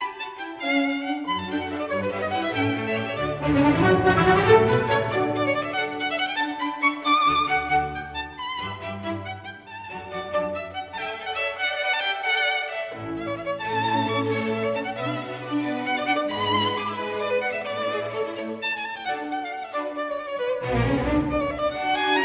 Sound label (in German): violinkonzert nr 4 d dur kv 218 rondeau andante grazioso